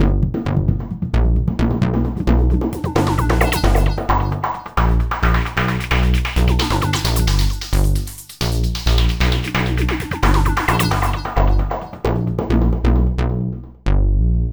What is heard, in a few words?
Hardcore Breakbeat, 132 Gmin; Big Fish Audio - Hardcore Breakbeat Action